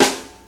vinyl rock snare drum sample g sharp

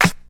old school good hip hop snare single hit f key

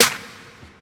high quality big room snare drum D# key

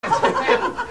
panned right laughter short

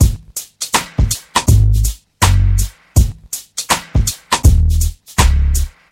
81 bpm drum loop A sharp key; Duration 0:06; Samplerate 44100 Hz; drum loops

